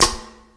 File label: crispy snare one shot F sharp key, Royality free acoustic snare tuned to the F# note